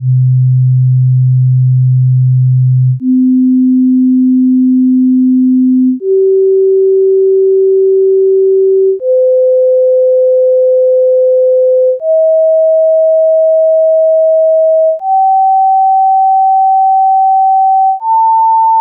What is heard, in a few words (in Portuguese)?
serie harmonica